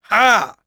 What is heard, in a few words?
get hit voice; UFC Tapout